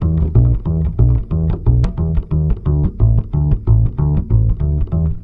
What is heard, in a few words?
BASS, Balkanish Traditions